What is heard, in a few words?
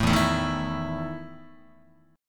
E Major 7th Suspended 4th E down Guitar